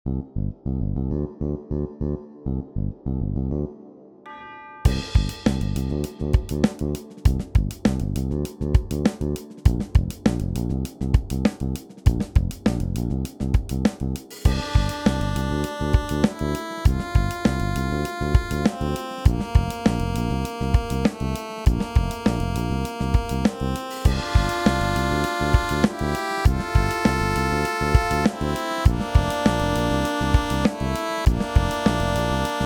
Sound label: Bell